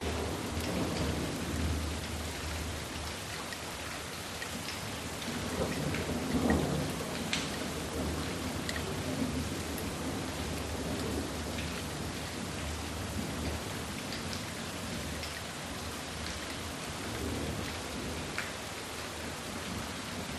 Rain Light Thunder Peel LOOP; PMSFX

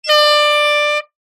sport air horn 1x blast; zapsplat

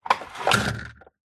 blind venetian drop down close fast hard; zapsplat

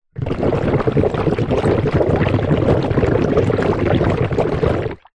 nature water bubbles foamy

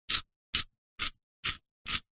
office pencil sharpener sharpen charcoal pencil

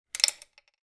leisure marble single roll into slot hole; zapsplat

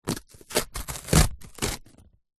cardboard rip; foley

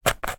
industrial drill cordless trigger press no power

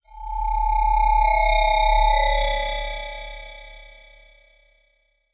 fm spooky metal shrill; sound design; zapsplat

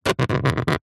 foley small spike scrape scratch thin plastic; zapsplat

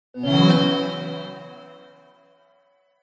multimedia game sound negative magical

